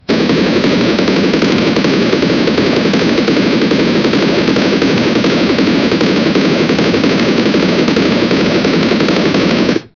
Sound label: rocket launcher fire